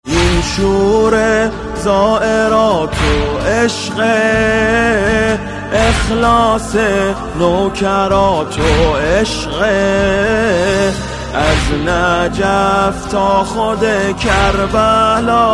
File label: ringtone